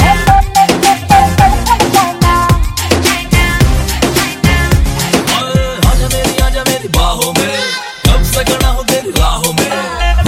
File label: Remix Hindi